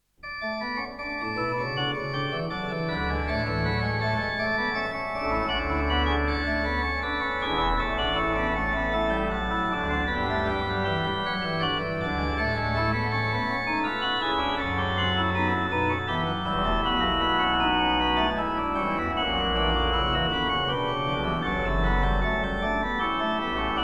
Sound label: organ, choral preludes; Erstanden ist der heil'ge Christ; Bach; Bach Organ Works